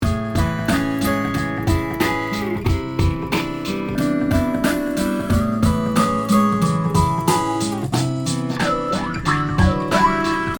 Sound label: delay phaser